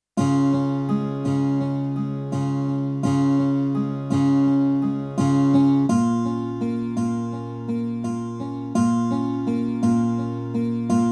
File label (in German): gitarre